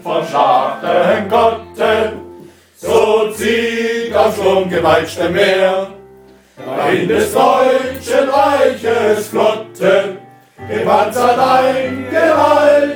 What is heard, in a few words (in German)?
Flottenlied mit Klavier